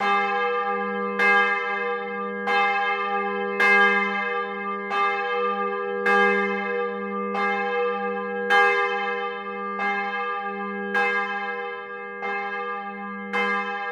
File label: church bell medium pitch